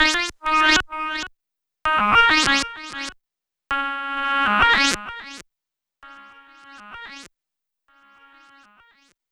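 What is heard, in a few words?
synth, Hip-Hop & R&B; USB Soundscan vol.47; AKAI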